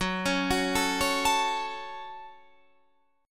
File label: Gb Minor Gb Guitar Standard; arpeggiated; JGuitar